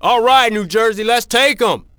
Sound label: NETS, fan; NBA Inside Drive 2004